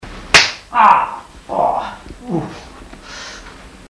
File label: slap links ah oh uff